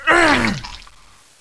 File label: Pain Female